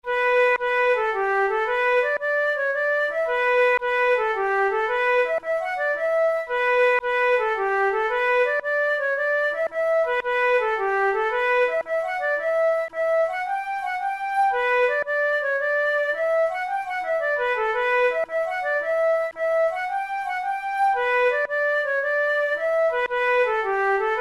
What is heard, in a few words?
cucanandy, flute